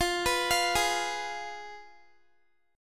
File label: F Diminished Guitar; x,20,21,22,20,x; 4E,4A,5D,5G,5C,6F; arpeggiated